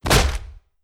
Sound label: hit; Leenkx Examples